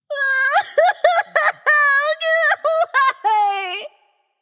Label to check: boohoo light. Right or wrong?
Right